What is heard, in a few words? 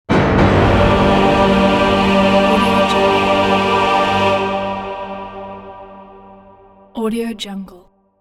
dramatic orchestral choir ending